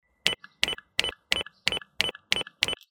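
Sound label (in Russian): звук домофона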